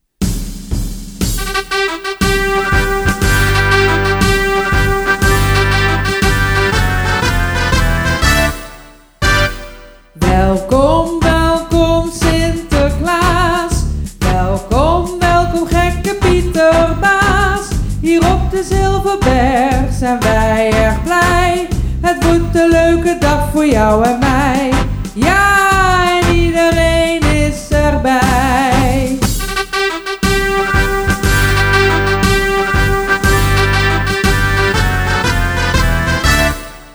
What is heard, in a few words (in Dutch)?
welkomstlied sinterklaas met stem